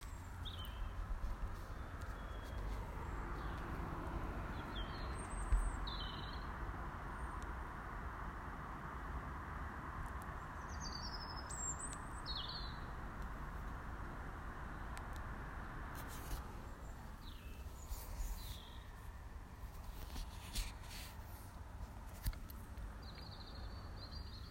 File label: A Robin calling as the sun goes down